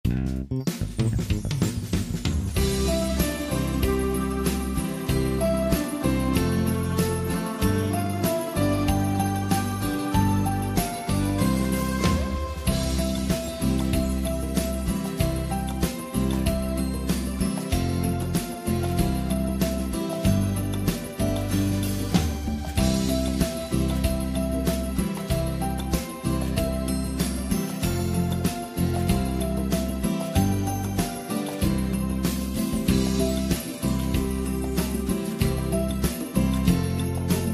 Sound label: Jingle Bells Karaoke; Yeni Yil Sarkilari